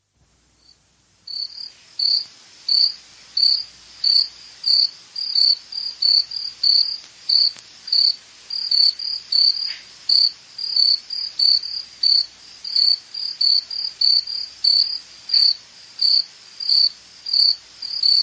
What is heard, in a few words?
Crickets